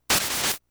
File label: Plok!; lemmings nes 1992